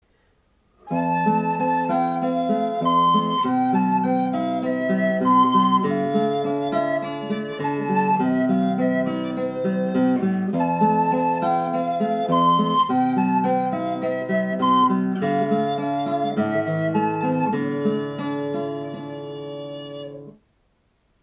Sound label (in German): Gitarre, Blockfloete; einstimmig; Schöne Stücke; mit Gitarre